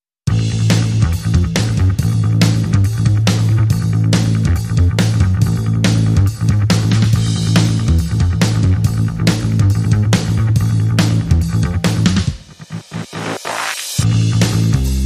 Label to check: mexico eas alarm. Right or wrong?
Wrong